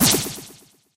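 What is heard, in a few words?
attack impact; max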